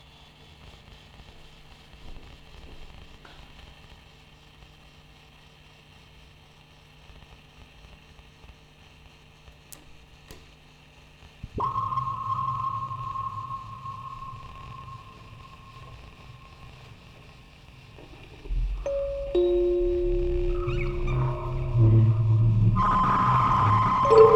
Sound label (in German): Aufnahme 14 Kanal stereo, Spieluhren-Quartett mit Tonband, Vocoder und Fieldrecording